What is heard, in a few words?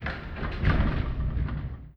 GATE Close Metal Large; mono; adams venture origins